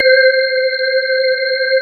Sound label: H-ORGAN C5; Q Up Arts; Dream Experience; DANCE